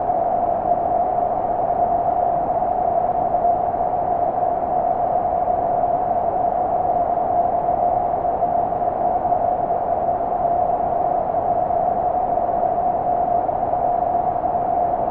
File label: noise; whoooosh!